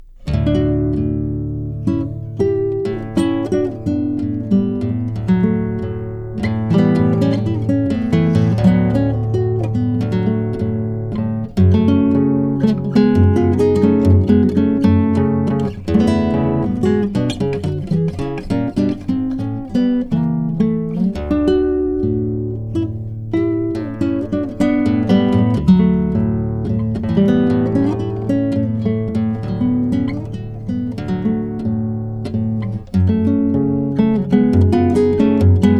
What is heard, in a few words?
Acc Guitar